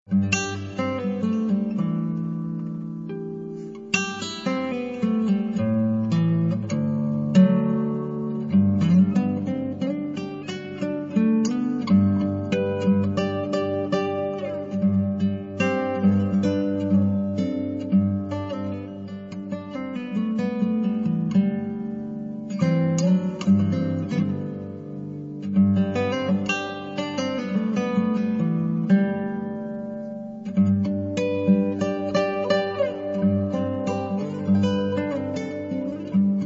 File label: ambient